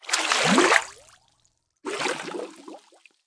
koi animation disappears; koi princess

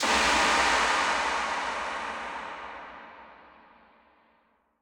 fs outro button; silverback

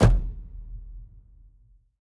quickStop; excalibur

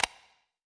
quick stop; king of slots

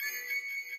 crystal blink loop; elements

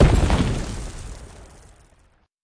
first Explode; pyramid

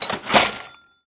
cashin End Sound; jackpot 6000

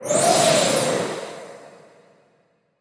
blizzard; wolfcub

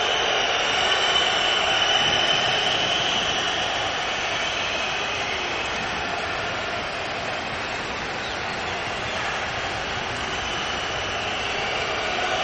ambience Bonus Game, football

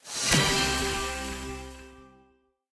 dazzle Scatter Hit; fortunerangers

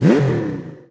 car Rev Oct Up; hotline2

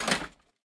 quick Stop; piggy riches

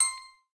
counter; spinsane

